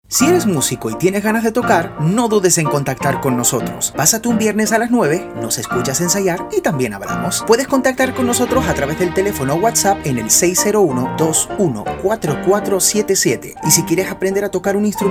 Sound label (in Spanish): publicidad banda academia